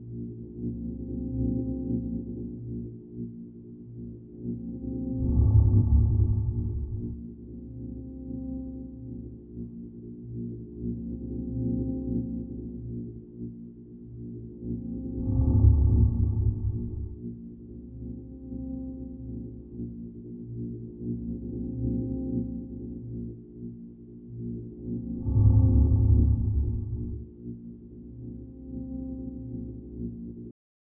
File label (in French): ambiance Fond Sonore